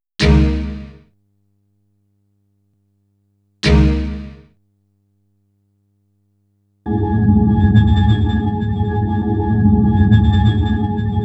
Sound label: Hacking SFX; GITS; Stand Alone Complex